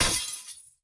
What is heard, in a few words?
glass break; Blind Drive